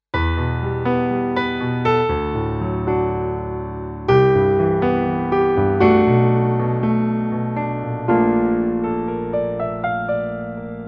Kawai MP7SE SK 5 Grand

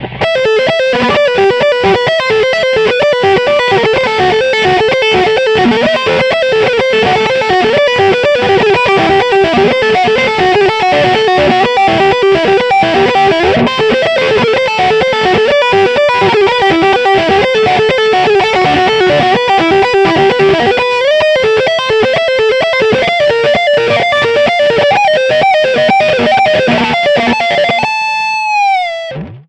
Lick

